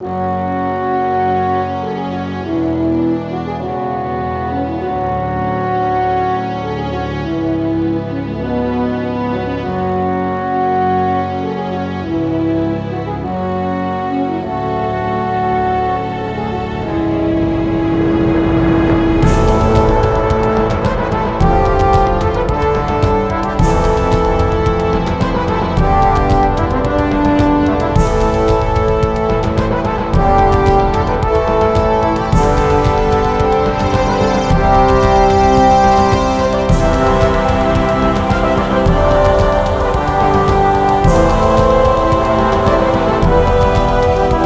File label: music victoria; mcdemo